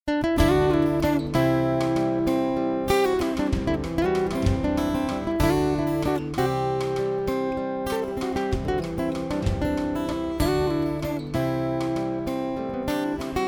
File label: music sample contemporary